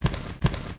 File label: run; Honeying